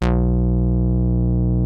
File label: TAURUS C3, synthesizer